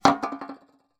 foley can beer empty down table wobble; zapsplat

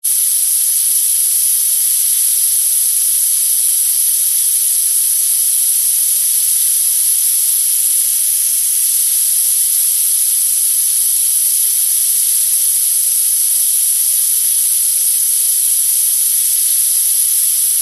transport car tyre puncture fast

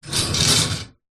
office metal wire tray drawer slide

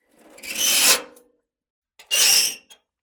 Just Metal Squeaks and Moans; soundbits

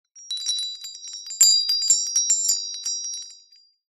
household wind chime metal small short ring

